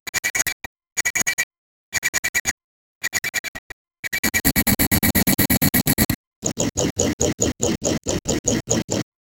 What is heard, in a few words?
create asmr sound effects, slicing